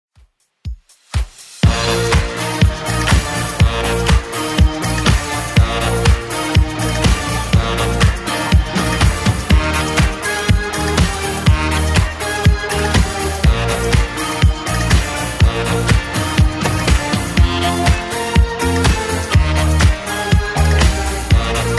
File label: 70s Redrum 122 bpm CLEAN